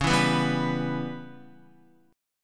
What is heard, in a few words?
Db Minor 7th Flat 5th Db down Guitar; strummed; JGuitar